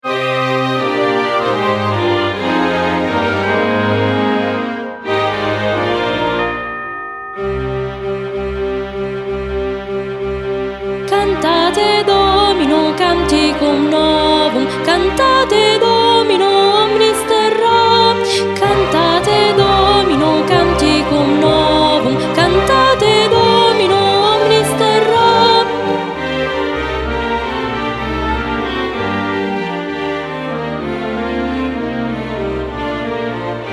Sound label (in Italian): cantate domino voci bianche